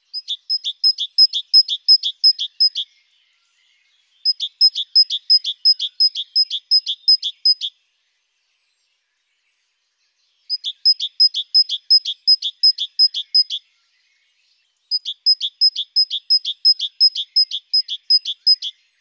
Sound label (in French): mesange charbonniere; dimanche matin dans la vallée de l’urne